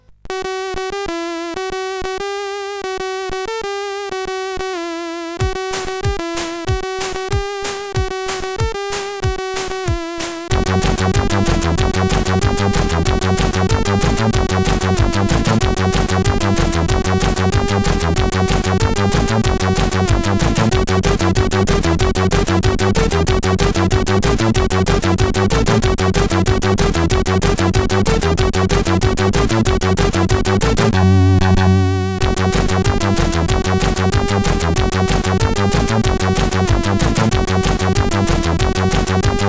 Techno Mix sid CSG8580

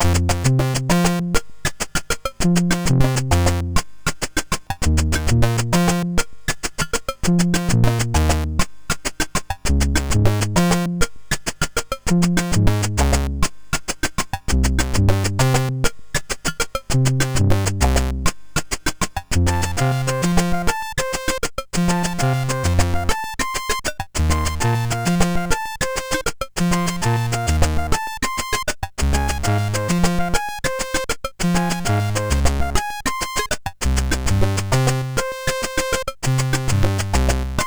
Latin sid MOS6581R4

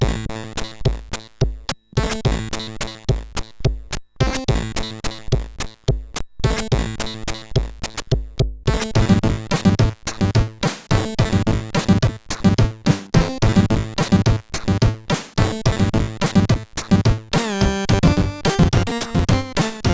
Beatbox, sid, CSG8580R5; Pinball Dreams

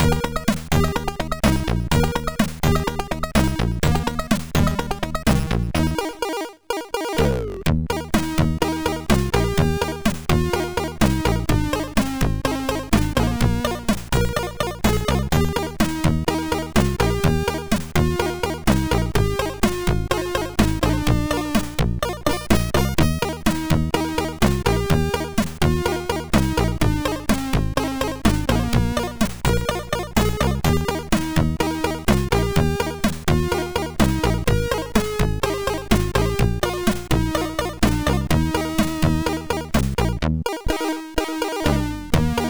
Loader Music, sid MOS6581R4

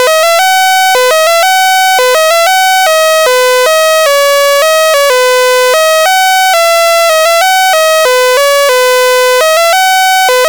sid 8580

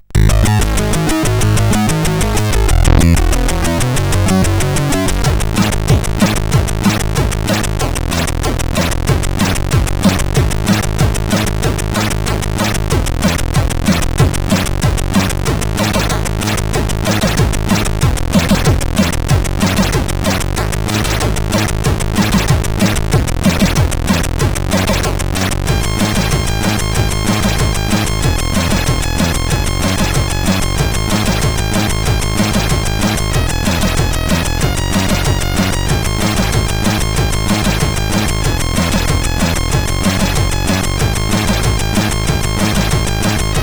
Acid Rain, sid CSG8580R5

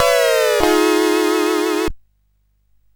Boing, CSG8580R5; hvsc

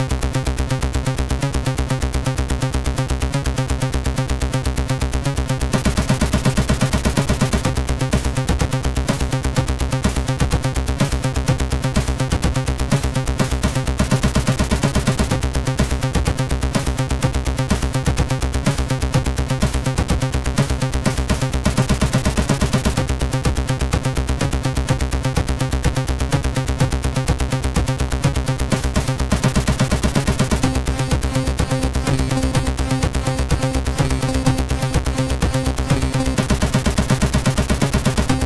Techno Bells, CSG8580R5 sid

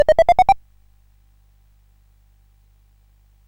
CSG8580R5, GAMES; Sammy Lightfoot

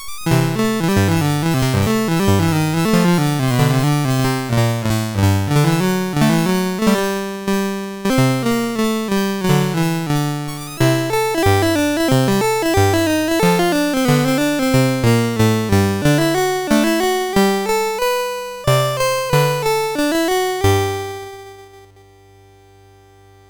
sid MOS6581R2, GAMES; Face Off

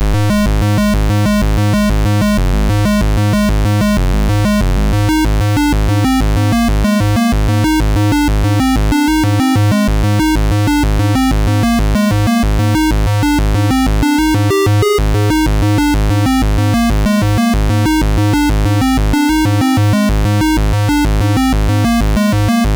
256 bytes sid MOS6581R2

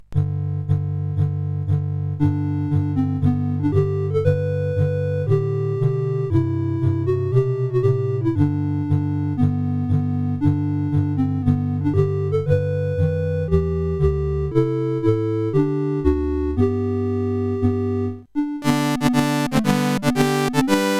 Battle Hymn, CSG8580R5

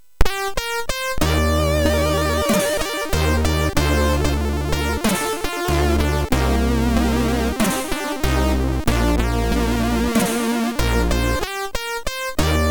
Slow Blues, MOS6581; MOS6581R3; hvsc

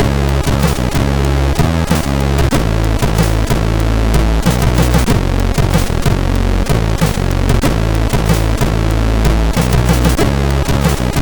Moby Bass, sid, CSG8580R5

